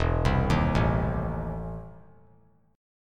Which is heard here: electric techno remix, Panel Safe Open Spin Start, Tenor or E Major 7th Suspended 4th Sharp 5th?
E Major 7th Suspended 4th Sharp 5th